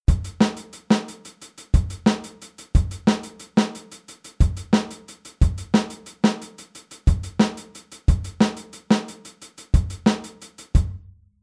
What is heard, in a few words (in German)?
Groove 16tel; Aufteilung linke und rechte Hand auf HiHat und Snare; Standardnotierung